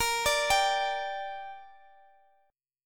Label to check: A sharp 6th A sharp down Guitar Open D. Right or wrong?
Right